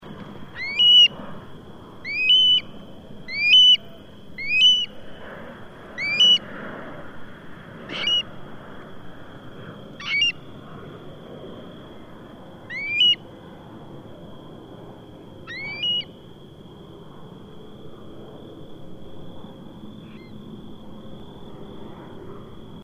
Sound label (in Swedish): Tjockfot